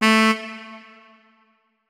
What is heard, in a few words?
notes, saxophone; Hideout Furniture